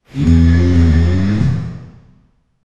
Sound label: slot, slot machine